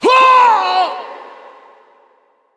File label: Wound; Bolvar Fordragon